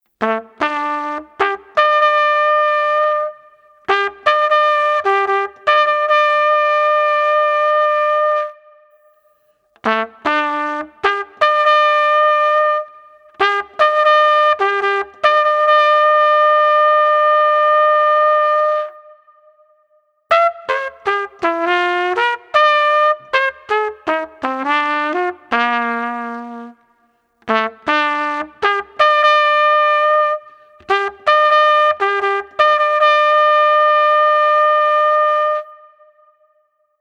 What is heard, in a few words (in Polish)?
Hejnał; Hejnał Rzeszowa